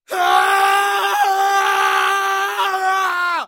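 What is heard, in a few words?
sounds with scream of burning person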